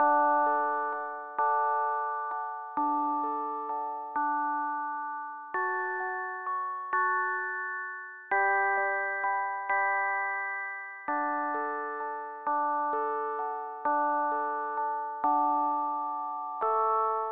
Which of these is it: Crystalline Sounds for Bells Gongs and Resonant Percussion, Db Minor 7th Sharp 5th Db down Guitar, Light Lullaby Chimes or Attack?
Light Lullaby Chimes